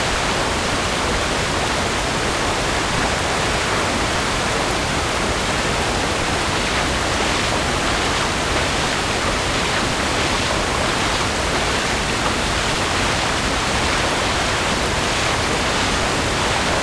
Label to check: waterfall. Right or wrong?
Right